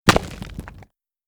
shale break low end crackling rock

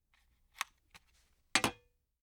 cell phone flip phone pull out of pocket open; prosoundfx

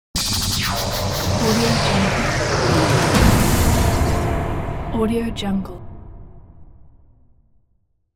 futuristic start fail

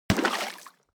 horse step water; animal; smartsoundfx

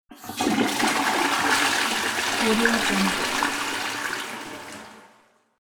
toilet flushing

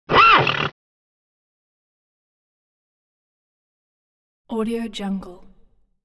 horse whimpering sounds; hollywoodedge

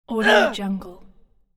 male grunt

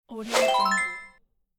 happy notify swoosh

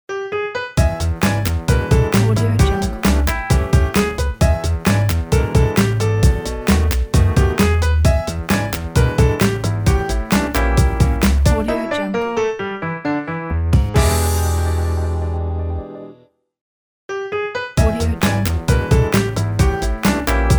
tv sitcom ident

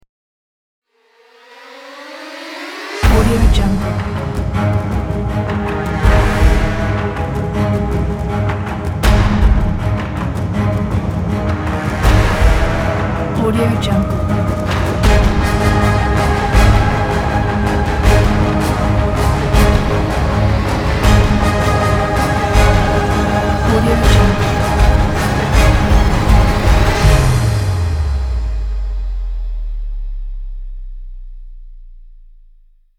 dark opening cinematic

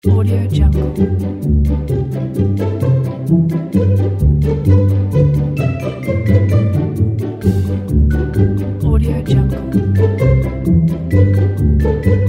back to lessons learning to count

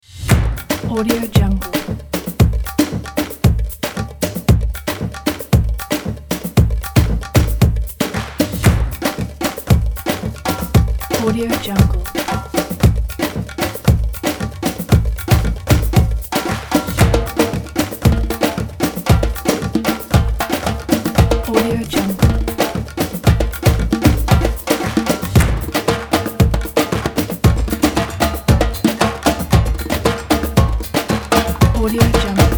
middle east drums

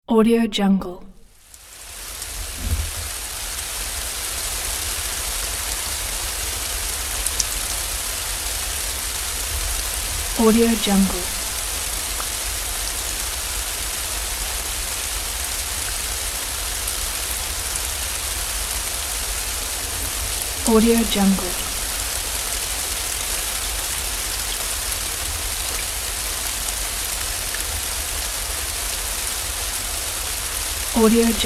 rain

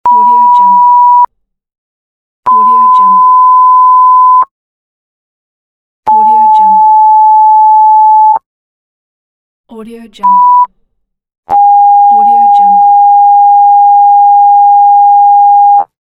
censorship beeps; pashastriker

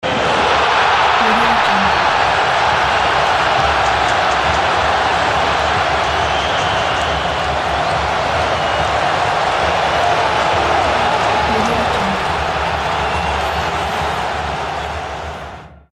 football goal

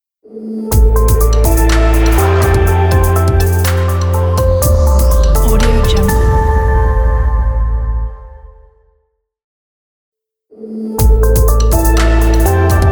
clear logo; matsteiner-fx

